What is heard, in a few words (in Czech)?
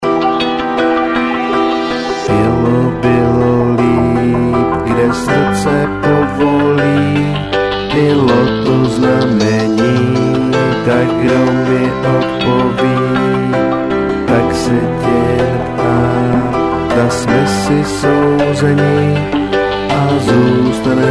Elektro pop verze